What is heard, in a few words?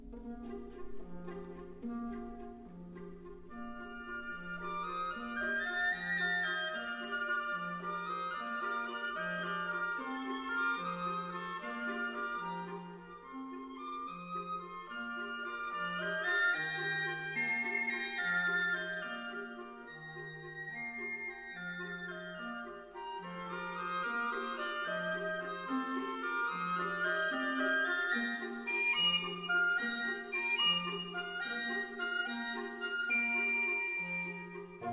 organ; Gadfly